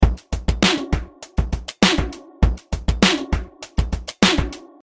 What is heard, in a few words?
short hip hop beat